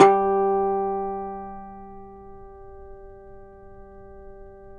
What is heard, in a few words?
YAYLI TAMBUR G; Spectrasonics; Hans Zimmer Guitars VOL-2